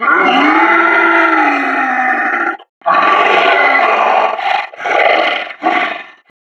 Taunt yeti standee speaker growl

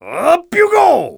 Spy taunt flip exert; (напряжённо) «Лети же!»; Насмешки шпиона

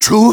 taunt rps exert

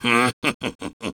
laugh short, es

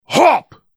Jump; Versus Saxton Hale

